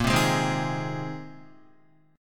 A sharp 7th Suspended 2nd Suspended 4th, Guitar; A#7sus2sus4; strummed

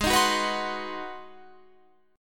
G sharp 7th Sharp 9th

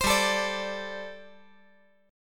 G Suspended 2nd Suspended 4th

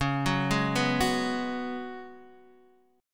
Db 7th Suspended 4th Db down Guitar Open D

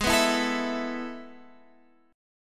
A Major 7th Suspended 2nd

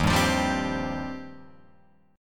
Eb 6th Add 9th, Guitar, Open F; strummed

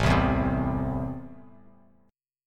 A Minor, Bass Standard; Am strummed; 5,3,2,5; JGuitar

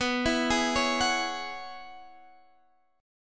Gb 7th Suspended 2nd Suspended 4th B

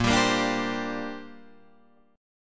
Bb 6th Add 9th Bb Guitar Open D

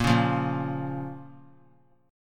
A sharp Minor A sharp down; strummed; JGuitar

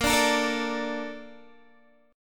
Bb Major 7th, Bb, down, Guitar, DADGAD

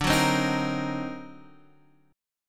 D 7th Flat 9th Flat 13th D, chord; X 5 4 5 4 6; strummed

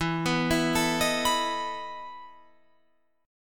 E Major 7th Suspended 4th, Guitar, Open F; EM7sus4 arpeggiated

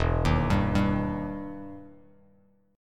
E Minor Major 7th E down Bass Standard

